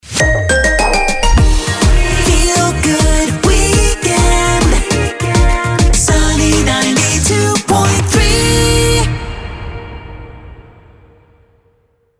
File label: Jingle; Feel Good Weekend; Sunny Sweeper